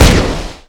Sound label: rifle; garrysmod